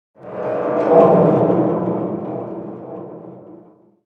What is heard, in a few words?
big chains groan